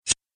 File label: Scissor Snip; Office Sound Effects